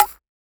HiHat; Ghosthack

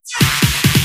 Loops Fills; Vengeance Samples

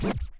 Small Cut, Vinyl Record Samples, Cuts

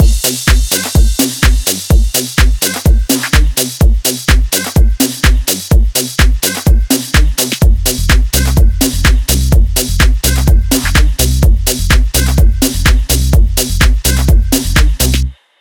126BPM Offshore Mix, TechHouse; Vengeance Samples; Bonus TechHouse Kits

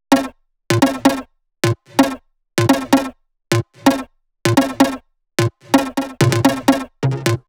Melody Kits 128BPM Root C; Vengeance Samples

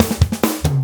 Fill 140 BPM

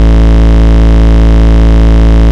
Bass Root A

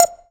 Synth Shot; Vengeance Samples

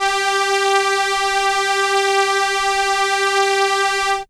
Violin G4, ARP Solina, synth; musicradar-synth-samples

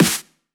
Snares; Vengeance essential club sounds-3

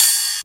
Ride, Cymbals; Vengeance Samples

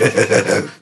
Vocal Oneshots; Vengeance Samples; Vocals

